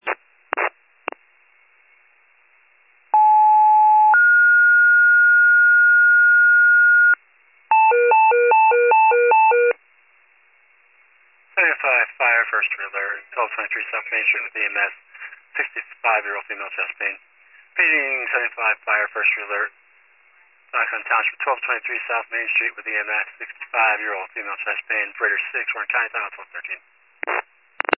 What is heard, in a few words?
fire, pager alert